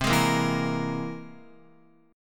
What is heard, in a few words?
C Augmented 7th, Guitar; strummed; JGuitar